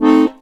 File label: HOHNER, akkordeon